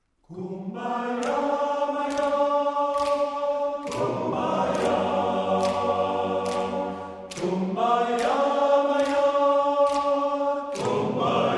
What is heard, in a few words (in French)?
musique